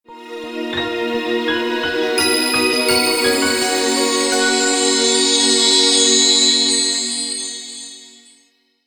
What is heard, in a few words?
jingle